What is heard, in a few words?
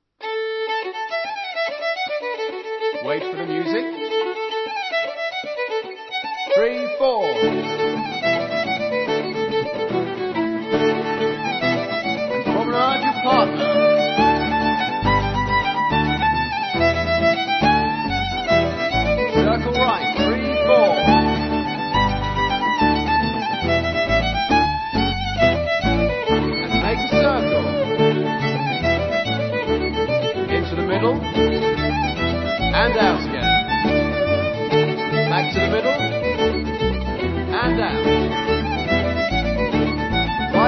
Jig reel; ceilidh